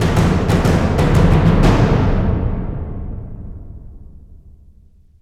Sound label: Boss Humanoid Cleared; Dungeon Hunter 4; Android